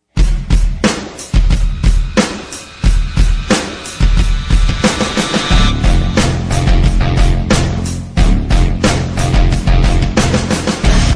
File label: theme song instrumental, ringtones; tna